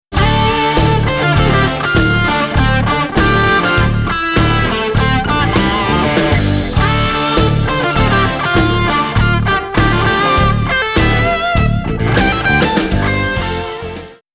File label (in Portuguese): Blue Tone Solo do Riff, guitar